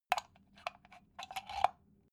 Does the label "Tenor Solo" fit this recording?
No